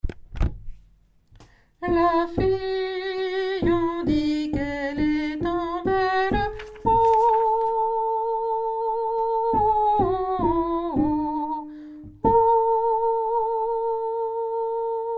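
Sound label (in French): la fille du laboureur hommes soprano; Montaigu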